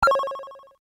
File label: Videogame close; Nokia; audio themes